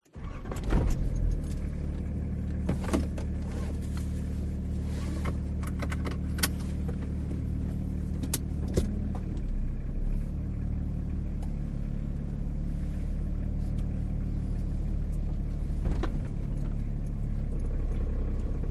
vehicles car nissan patrol 1998 4x4 diesel manual start drive through residential; zapsplat